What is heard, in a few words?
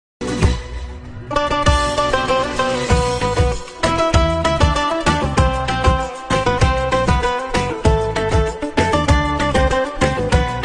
Guitar Lovely Music; موزیک بی کلام